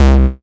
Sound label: Bass C, Offbeat Bass